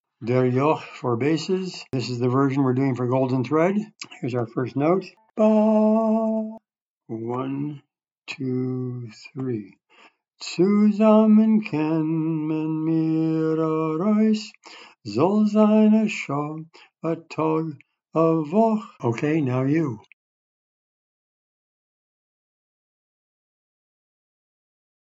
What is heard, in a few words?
bass; Der Yokh; Listen and repeat